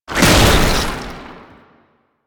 Proto Infested Babau Underground Attack Stop, Enemies